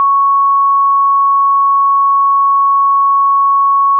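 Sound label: Clean Sine DX100 C#5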